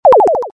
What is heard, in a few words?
Nanoswarm nano die